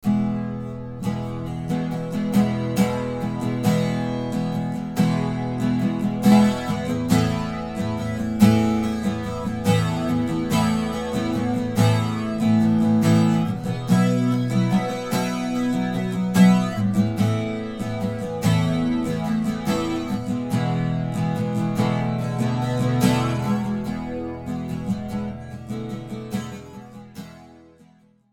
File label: flange ac guitar